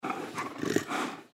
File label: Leopard Breathy Growl; Leopard Sound Effects; Animal